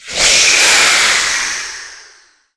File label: spider monster dying